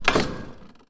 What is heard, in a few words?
Impact heavy metal